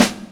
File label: soft drum